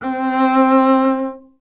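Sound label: string contrabass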